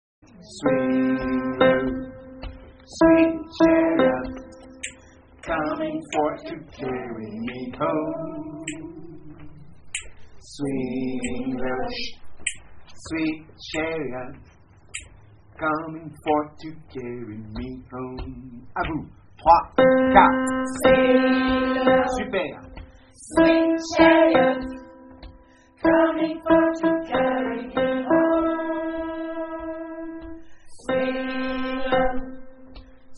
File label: Swing low alto; Alti